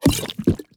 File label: potion use